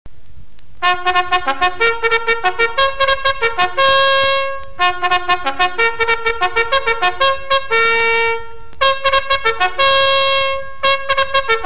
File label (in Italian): ritirata